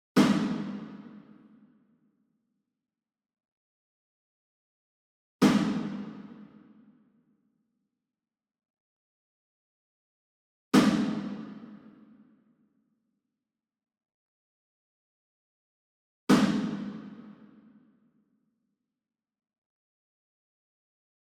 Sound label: SINGLE DRUM Shime Daiko Hit Far, Cinematic Strikes; boom library; Cinematic Strikes Construction Kit